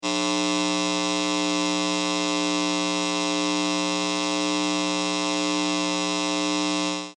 Electric High Voltage Lines, Buzzing electrical wires; Electricity sounds